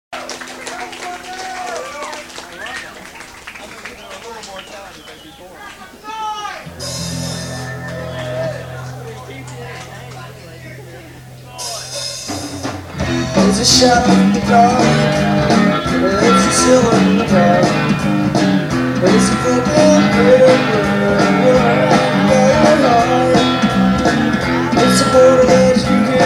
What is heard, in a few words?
Whistling Song